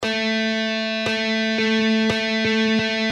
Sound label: Guitar triplets